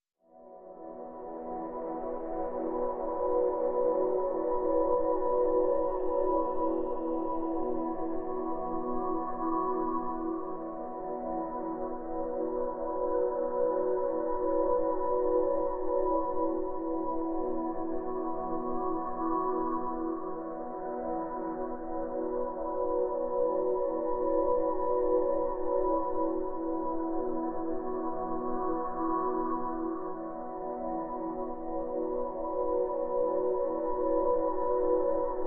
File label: Pads Bliss (E)